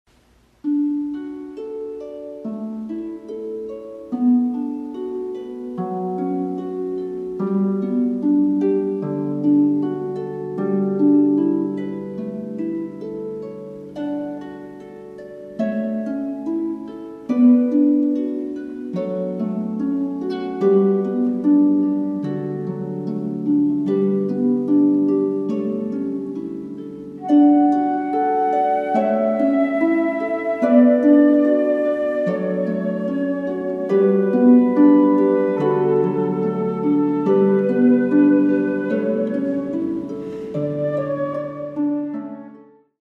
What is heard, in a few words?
Canon in D; flute; guitar; harp